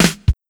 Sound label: Tom Roll Fill